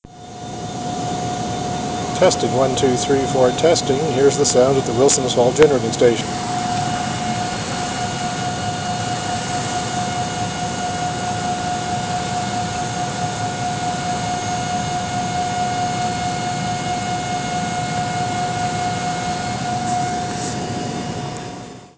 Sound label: Falls Generating Station; Wilsons